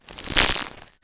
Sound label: foley synthesized electricity heavy; crackle